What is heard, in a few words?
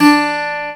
CG HARD D3, Celtic Flavours, GTRHARD; USB Soundscan vol.42; AKAI